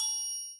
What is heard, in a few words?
message; MediaWikiChat